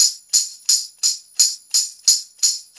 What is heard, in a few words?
Brass Tamb, Perc Loops; Sonic Foundry; Drum Tools